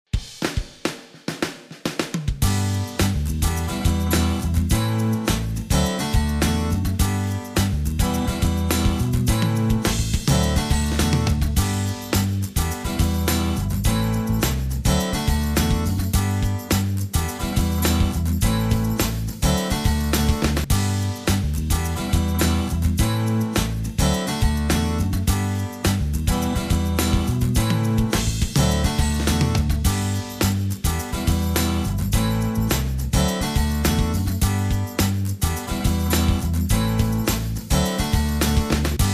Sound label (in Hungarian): szignal zene; Mariathon; adomanykerohoz